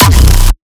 Hardkick B; Atomize2

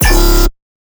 Bass Shot 200 C#; exciplex sample presets pack; JinoBeats

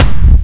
Kick, C3; Guitar Rock Tour

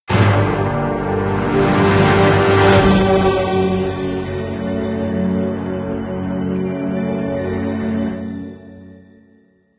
Match Lose

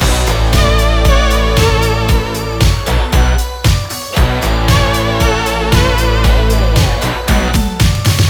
Spooky Breakdown Loop

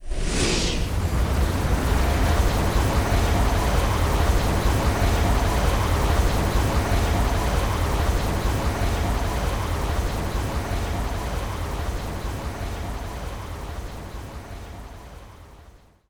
Strongattack Loop; gamerip; Enemy Boss Common

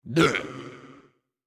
Effort Tiny

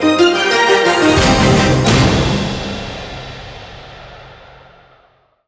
Bonus Outro Stinger; Skylanders Cloud Patrol; Arcade, iOS, Mobile

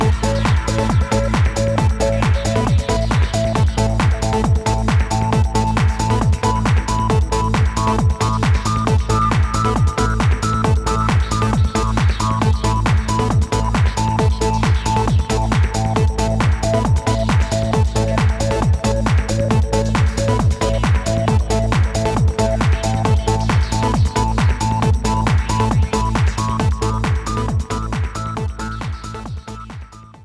Pre-match music; FIFA Soccer World Championship; PS2

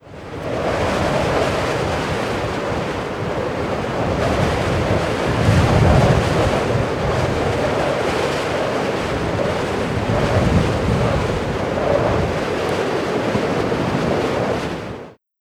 Cities Wind

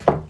Run

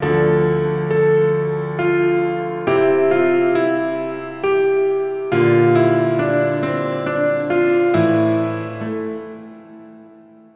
PIANO

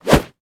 Whoosh Low; The Binding of Isaac

